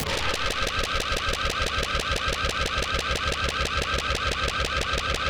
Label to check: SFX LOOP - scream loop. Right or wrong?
Right